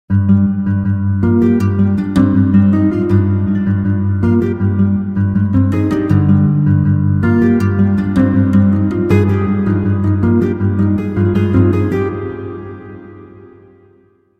harp guitar melody

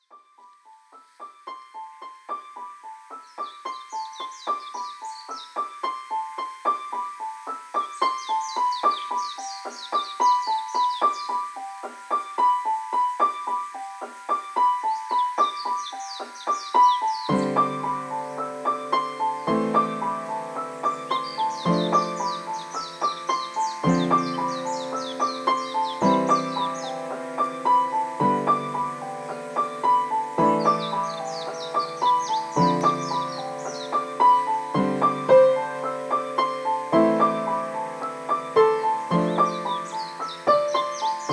room piano

